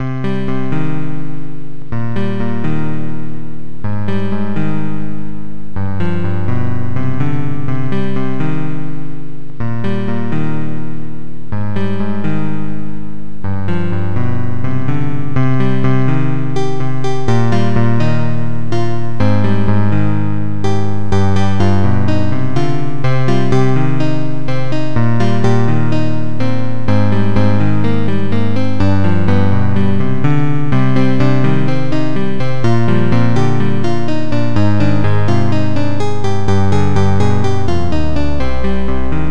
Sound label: gitar tune; gitarytune; Protracker and family; Noisetracker M.K.